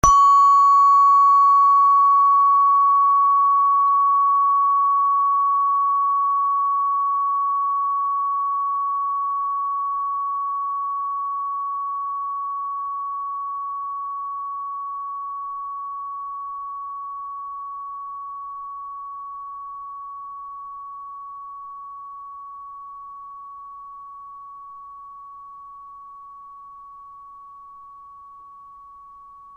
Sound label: space bar pluto